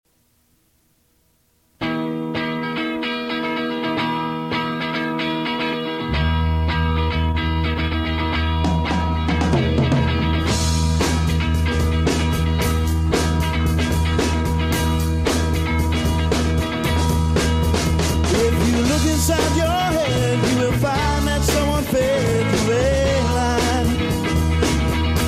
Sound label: Thunderstorms; gatos